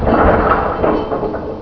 rack stop; hl2

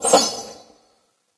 hop, antlion guard; hl2

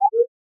owl notification; 3maze; UI FX

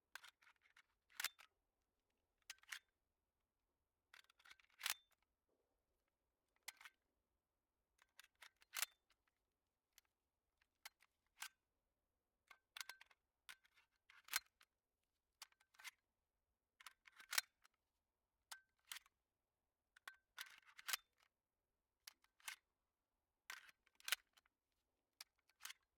Desert Eagle - Magazine In and Out; Pole Position; Desert Eagle .44; VAR SFX; MKH8060

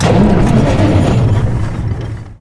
digger start engine, vehicles; hl2

